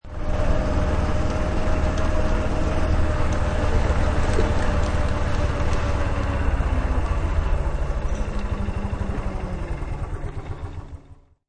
Golf Cart Slow Down, High quality recording a golf cart driving and then slowing down; Sound Effects:Sports